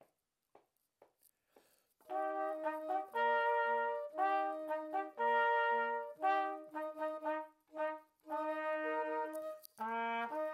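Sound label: trio with percussion; hunters chorus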